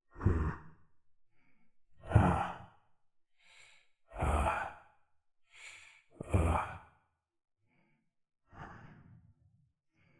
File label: breathing; cultist